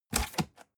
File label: cardboard box close fold scrape short